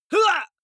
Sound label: male fight hit shout; comedy-cartoon; soundpool